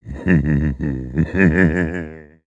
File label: Demon Laugh; Blue Demon; destructibles